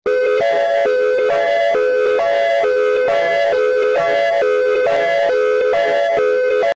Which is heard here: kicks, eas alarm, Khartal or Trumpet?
eas alarm